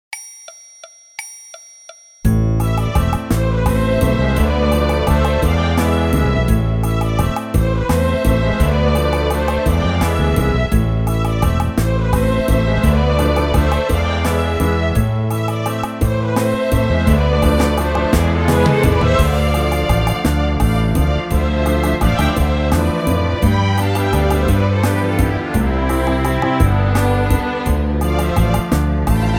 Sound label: Orchestra Version